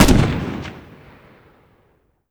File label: fire; gmod; viper